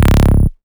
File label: Bass Shot C#; Mutekki Media; Vengeance Electroshock Vol.2; Bass Shots